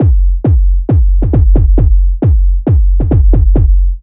Heavy Kick Loop 135 bpm

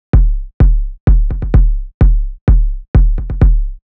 Loop Kick

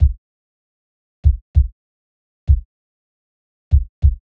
Soft Kick Loop 97 bpm

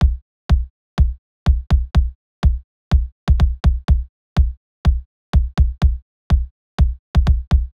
Clean Kick Loop 124 bpm

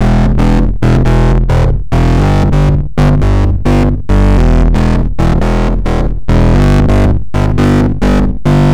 Britisch Crunch; Camel Crusher

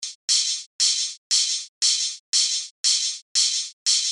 hihat house flow

